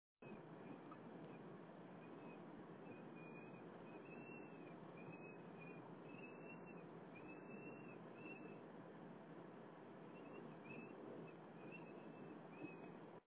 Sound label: high pitched noise when turning steering wheel